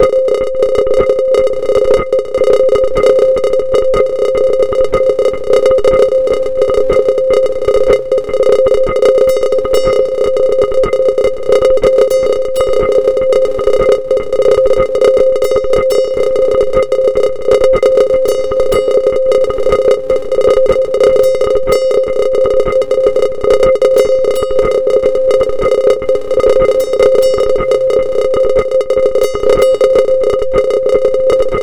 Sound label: jingle